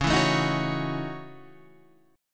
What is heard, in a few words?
Gb Minor 13th Db